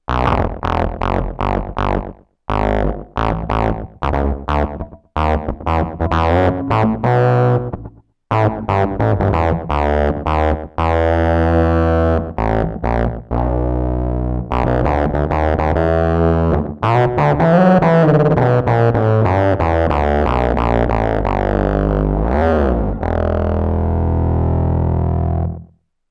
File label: MULTI SYNTH, Zoom 506 II